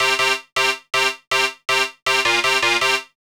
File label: synths; Temple; Zero-G - Hardcore; DJ Dougal